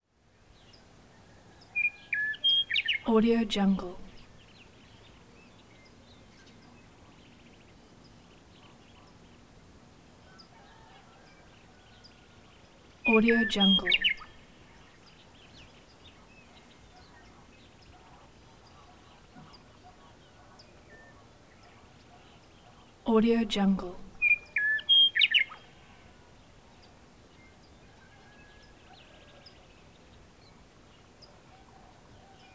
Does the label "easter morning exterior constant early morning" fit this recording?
Yes